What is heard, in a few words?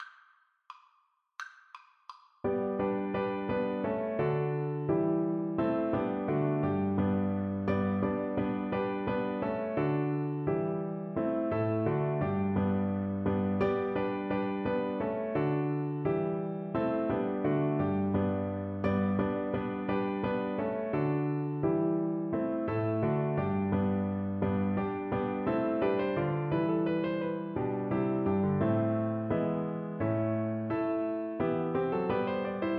terp bransle, recorder